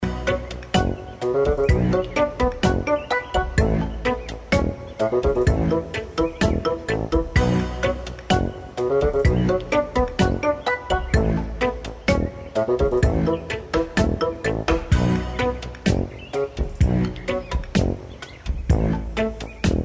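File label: Detective Sound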